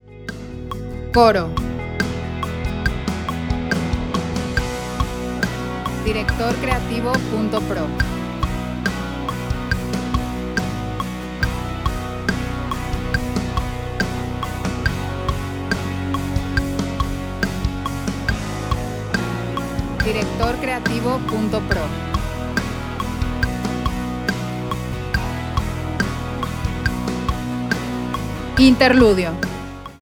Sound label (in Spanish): Medley Siempre El Himno, B, 70, 4/4